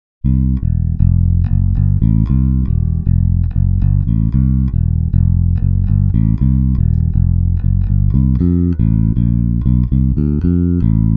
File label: JB neck